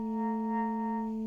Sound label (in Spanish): Multiarmonicos